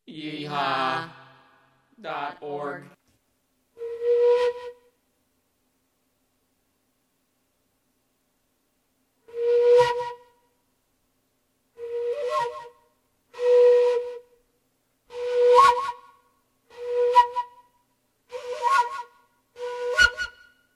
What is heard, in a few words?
flute